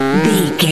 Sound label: retro damage hurt ouch